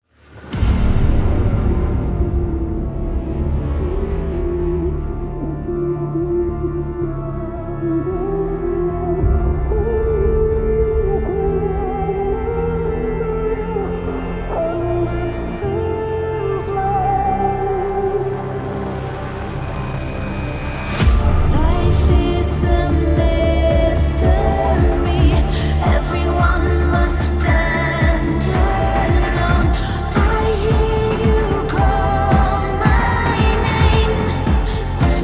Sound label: epic version; deadpool wolverine trailer music